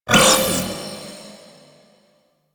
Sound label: Droid Array Shot, weapons; Warframe; Cephalon